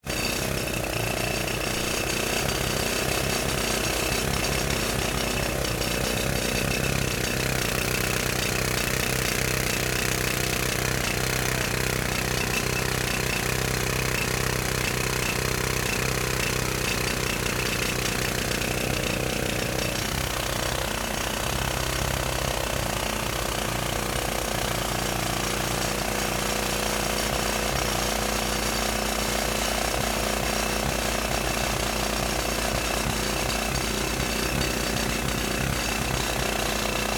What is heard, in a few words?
construction jackhammer drill concrete wall; RedSonic